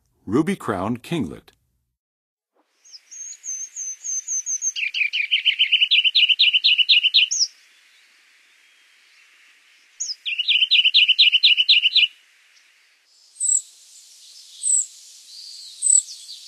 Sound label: Ruby-crowned Kinglet, Songs of Birds; North America; Western USA; Western USA Songbirds